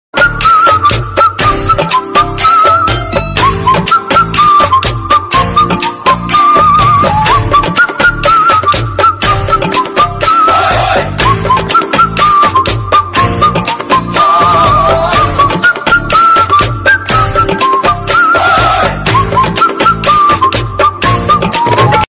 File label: instrumental ringtone music